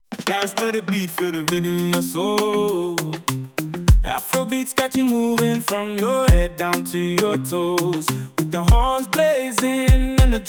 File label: dance to the beat